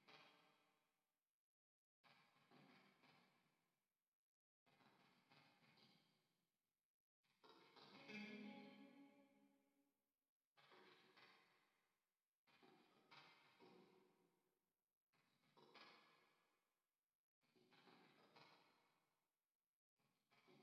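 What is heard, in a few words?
guitar Heavy Verb; starbites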